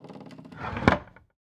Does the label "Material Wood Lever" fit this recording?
Yes